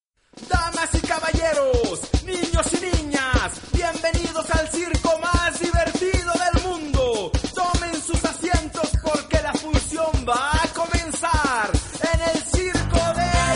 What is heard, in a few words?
pipe